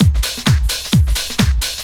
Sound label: drum 130 groove; Techno Trance Essentials